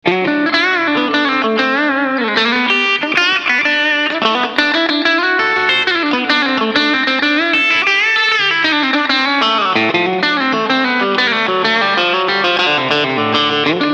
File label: Country; XTL Patches